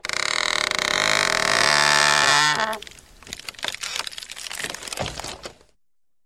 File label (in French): Arbre qui grince